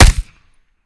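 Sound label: akilo47 suppressor player, weapons; viper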